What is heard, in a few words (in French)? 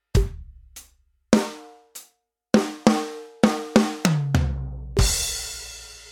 fill 50bpm, batterie